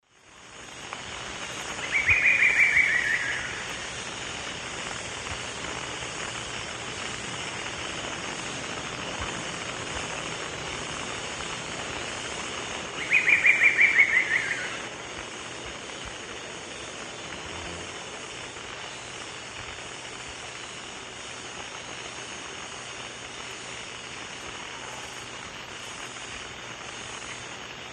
Dendrocolaptes certhia, song; S. America; Eastern Lowlands

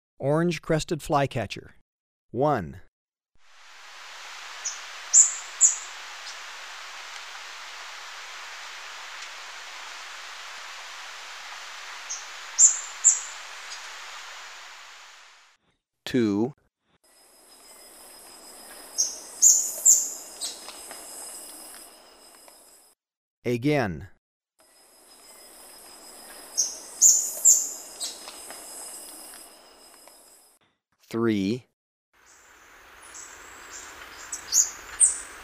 Orange-crested Flycatcher, Birds of Ecuador; S. America

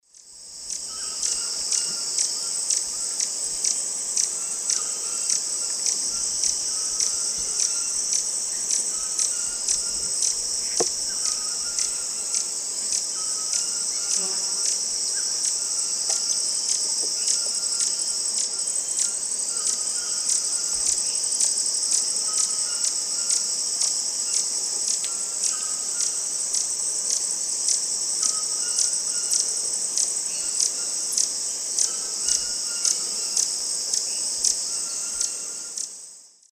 Amazilia fimbriata, call; S. America; Eastern Lowlands

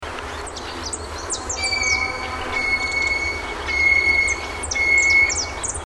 Whistling Heron; S. America

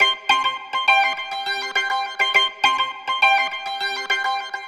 Pit Strings

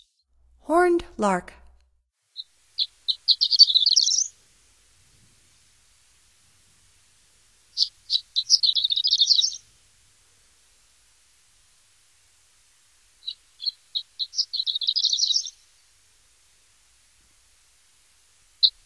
Horned Lark, Songs of Birds of North America; Stokes

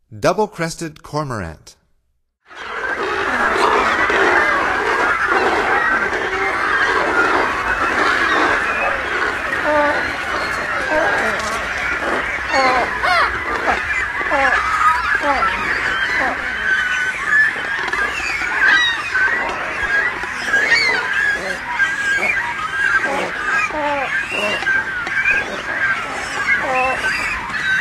Double crested Cormorant; North America; Stokes

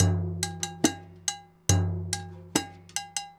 timb cows 140, latin, 140bpm; Sound Effect Library

